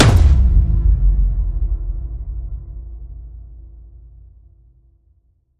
Hit Big Bomb Drop

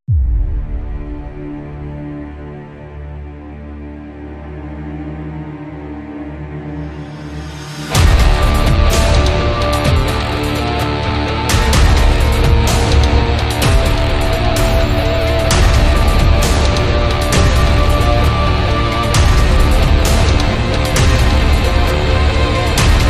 building climax

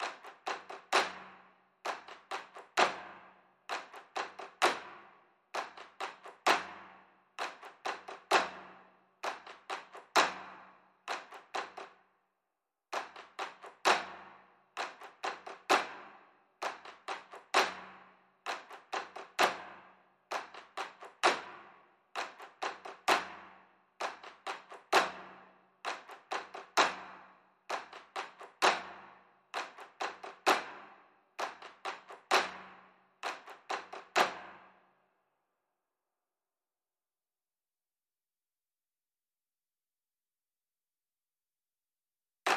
Loop Layer Sticks

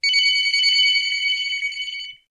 Beeper Trail

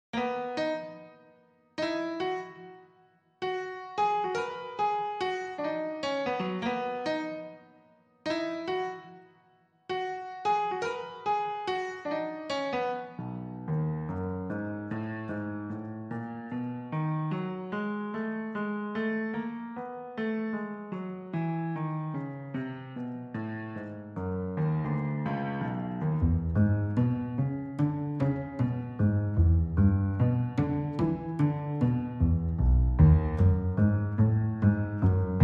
Piano Double Bass Clean

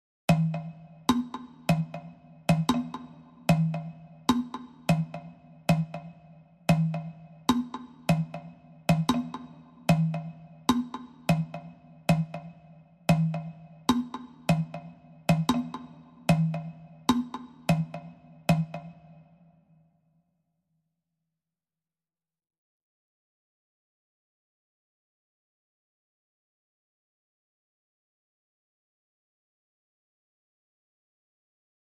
Hook

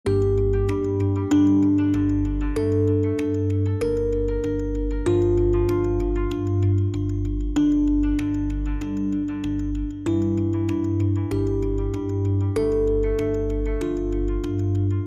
Uncertain Marimba

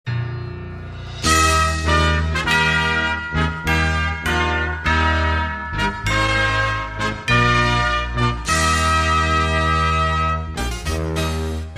Joy To The World, Brass Band and Small Group

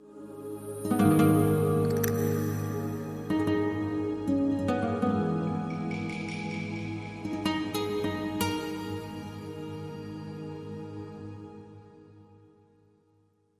Spanish Flurry

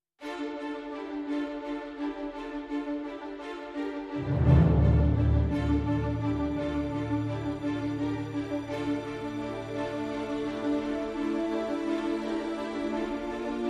No Perc

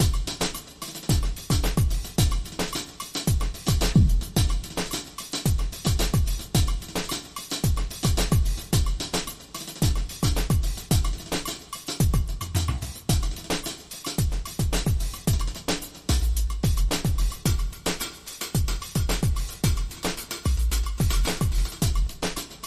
Hi Hat Groove

